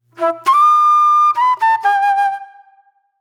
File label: Mellow Flute